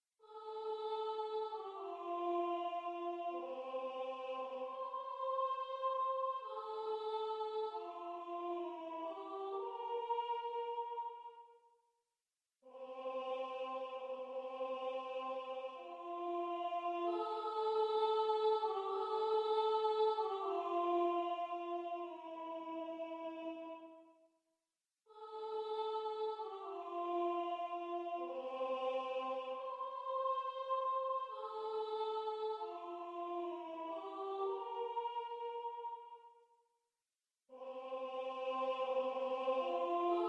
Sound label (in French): choeur des esclaves soprano